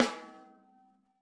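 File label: RAW snare drum, Drums Acoustic; AKAI; 90s sample CD; USB Soundscan vol.10; RAW KIT 3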